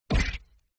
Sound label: punch